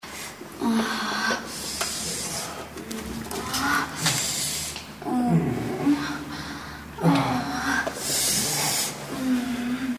Indoor Foot; Sexy Witch 3 Hot Meal

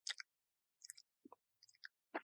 slurp; Angry Girl

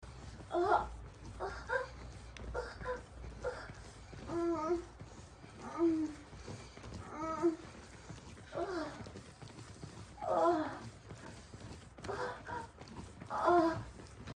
sex, moans; The Witcher Hunt

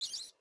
Rat; Treasure of Nadia

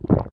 Swallow; Treasure of Nadia

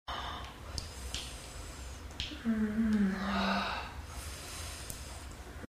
jerk, moans; The Witcher Hunt

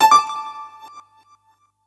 Button; Treasure of Nadia

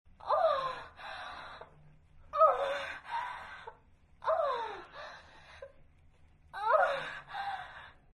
sex, moans; The Witcher Hunt

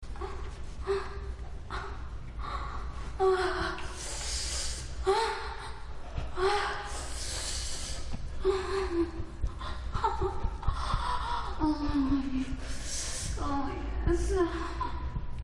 sex, moans; The Witcher Hunt

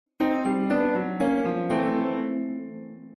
failrue; The Witcher Hunt

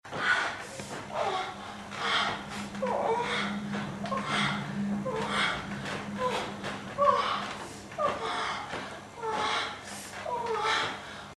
sex, moans; The Witcher Hunt

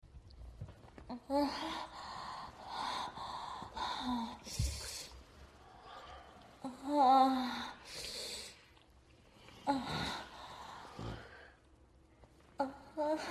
moan, moans; The Witcher Hunt

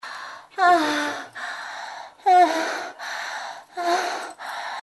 moan; Low Blow

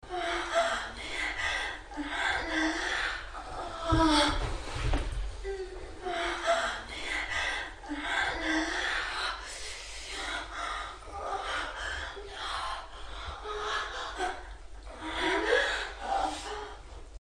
sex, moans; The Witcher Hunt v.1011

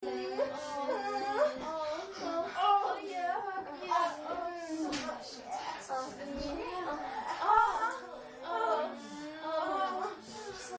many sex, moans; The Witcher Hunt

